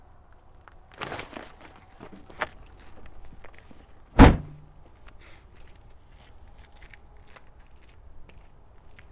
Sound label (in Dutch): Pak Tas Uit Achterbak